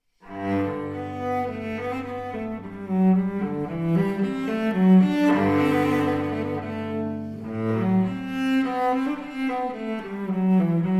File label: Cello Suite in G Major, Menuett I - Menuett II; Cello Suite No. 1; BWV 1007 V.